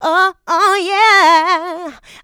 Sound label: Vocals, Adlibs; Houseworx